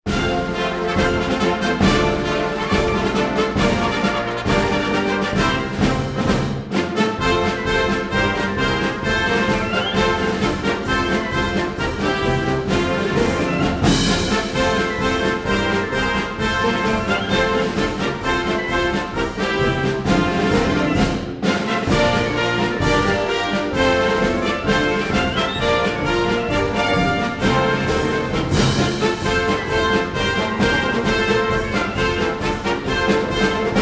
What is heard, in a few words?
the marines hymn three verses; Ceremonial; Marine Corps Birthday Ball